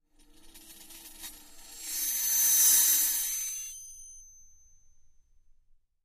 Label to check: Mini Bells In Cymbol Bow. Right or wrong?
Right